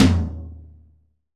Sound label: TOM, CTD TOM B